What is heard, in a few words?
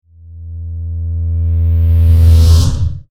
electronic woosh